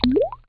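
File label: single drip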